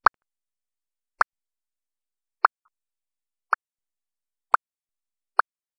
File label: Bubble Pop